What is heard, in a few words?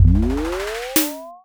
rocket